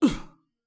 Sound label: Voc Male Land